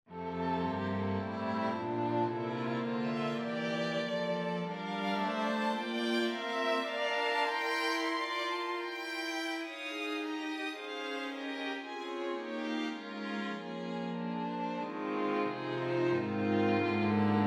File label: Ravel String Quartet Excerpt